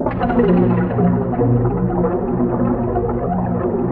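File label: WATER WAVES; Pro-Rec - Synthsonic; JV FILM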